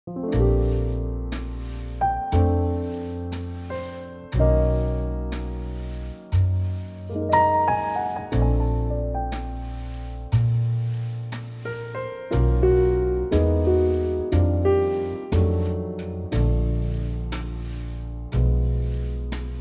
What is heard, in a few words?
Bb trio